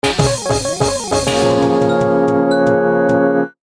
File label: jingle